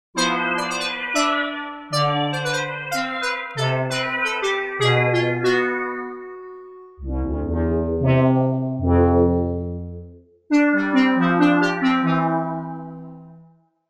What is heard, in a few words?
Nice keys